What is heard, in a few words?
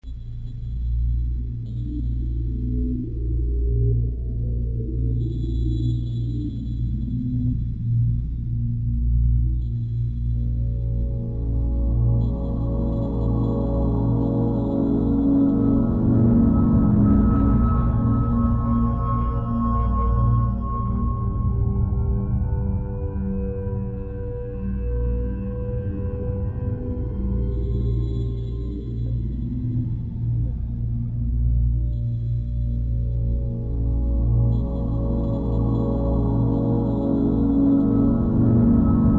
game music